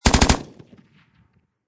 Machine Gun Russian Pkm Short Burst Close Perspective; Guns & Military